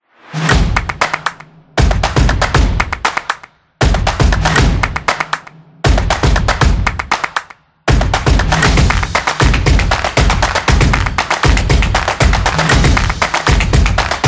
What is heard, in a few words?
The Clap Beat; football promo